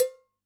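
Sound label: cowbell, Yamaha DD-65; PERC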